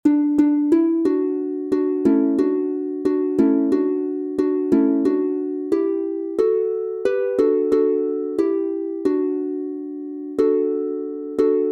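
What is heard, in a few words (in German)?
D Dur 3 stimmig, Harfe; Es ist fuer uns eine Zeit angekommen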